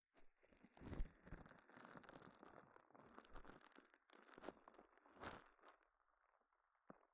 膝枕, 耳かきに関する音; 3DIO

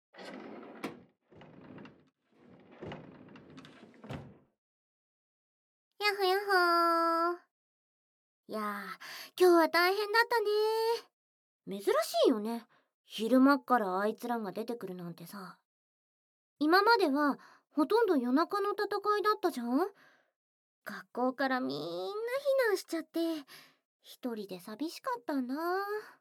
耳舐め・耳キス

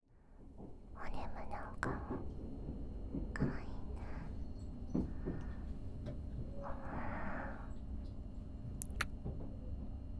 両耳を挟まれてのW耳舐め, ASMR; 寝台席車両

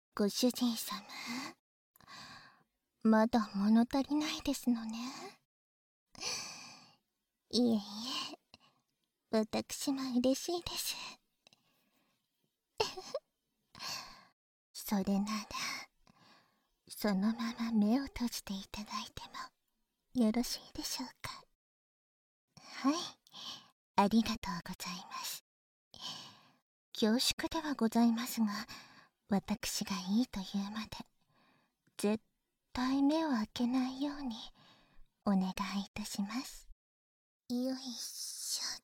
両側耳舐め囁き攻め, バイノーラルマイク収録

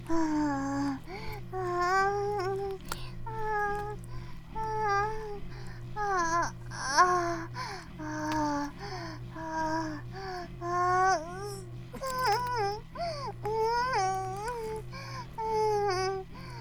半イキ 放心; イクたびにわけて

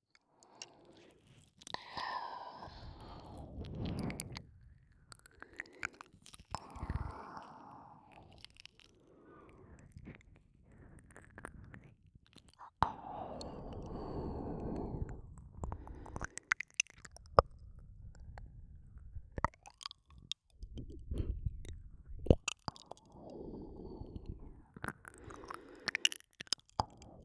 右耳舐め

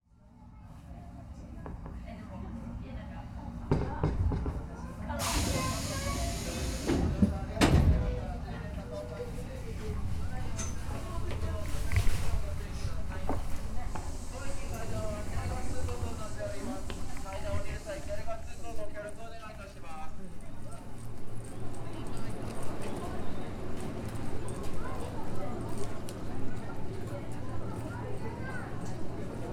下車～改札出るまで(環境音); カノジョと!～ひなと初めての山登りしてみよっ♪～

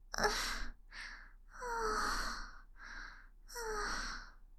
余韻・吐息; 舐め屋; ゆるふわ羊のパティシエさん; 首輪ちゃんのわけありや

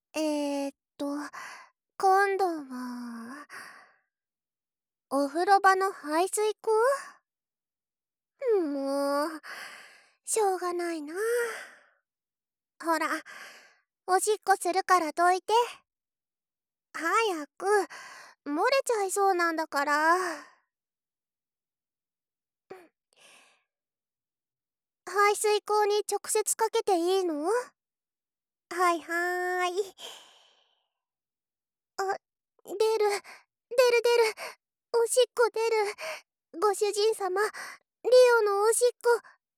排水溝に おしっこ; SEなし差分

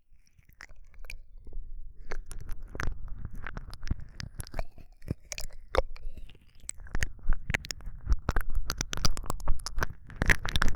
耳舐め, ASMR

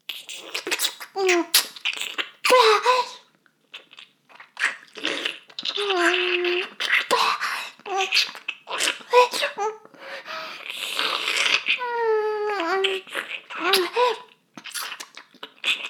フェラ音声; 少佐殿; 牧場戦腺

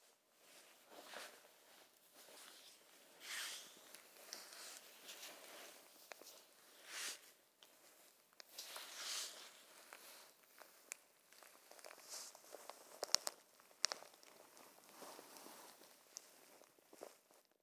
衣擦れ; シックスナインへ; アダルト系

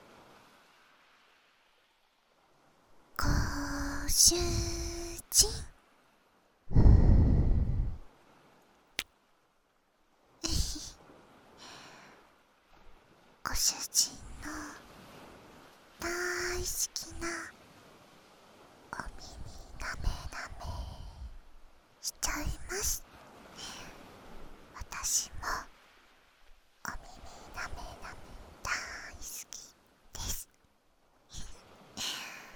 しゅわしゅわ耳舐め, ASMR

